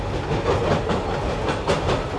Point, Train; OpenBVE

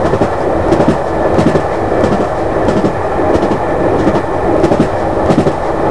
Run, class 66 train; OpenBVE

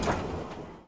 tbc click, Train; OpenBVE

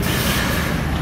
air, Train; OpenBVE; Jerome Yard

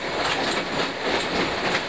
Point, Train; OpenBVE

